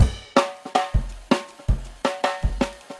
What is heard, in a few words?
160 live jungle, breaks